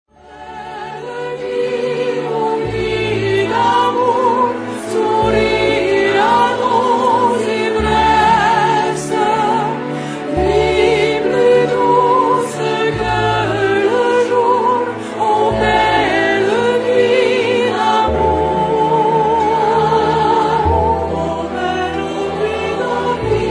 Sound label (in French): Barcarolle SATB; Duo Classique